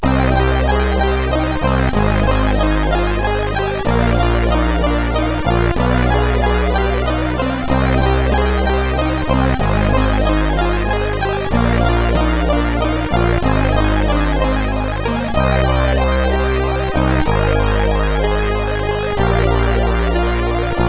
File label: Great Waltz, CSG8580R5